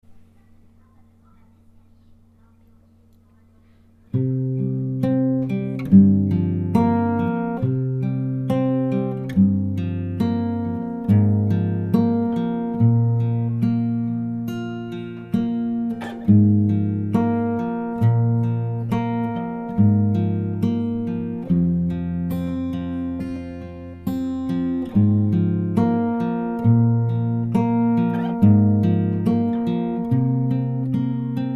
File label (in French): guitare